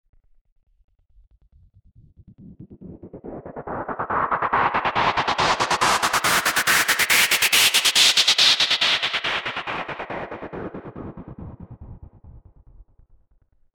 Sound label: Pink Noise Effix Delayed; Techno